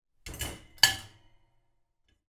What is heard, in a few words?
household glass dish place on metal wire basket draining rack